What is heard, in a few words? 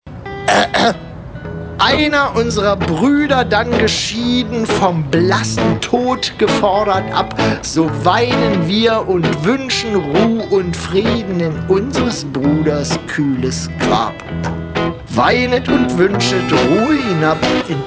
blues